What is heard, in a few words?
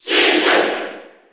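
Cheer Japanese; Sheik; SSB4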